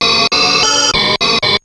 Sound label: TECH KIT, RAVE; Rubber Chicken Software Sample Bank